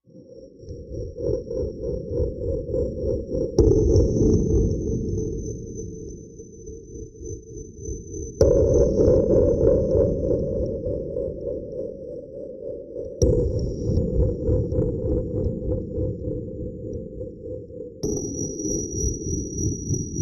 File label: Slw Spinner Machine Sl, sci-fi ambience